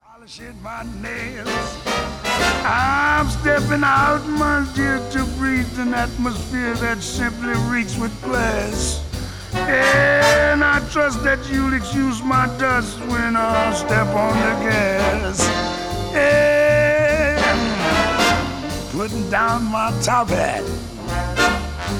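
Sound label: saxophone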